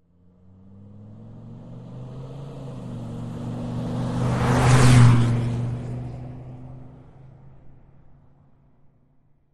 Dodge Ram Fast Accel, Dodge Ram: On Board Fast Driving, With Accel